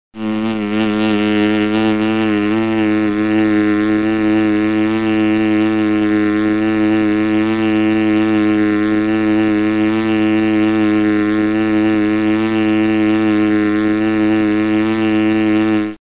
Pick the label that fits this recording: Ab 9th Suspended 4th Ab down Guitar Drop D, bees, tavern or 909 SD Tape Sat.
bees